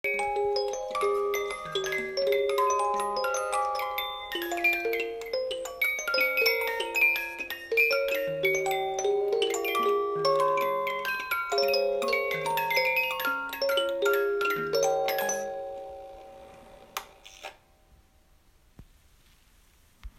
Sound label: Music Box